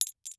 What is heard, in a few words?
Foley Coins Single Coins Cling